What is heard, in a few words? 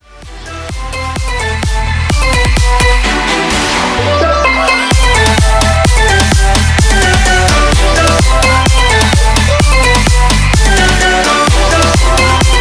Caribbean Rave